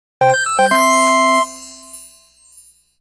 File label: intro event puzzle